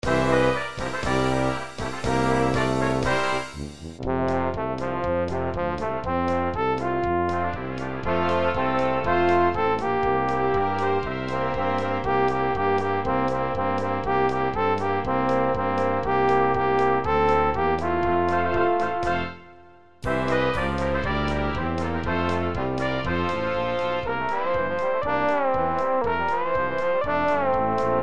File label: Alphorn